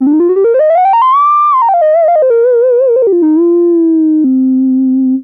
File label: high triangle with glide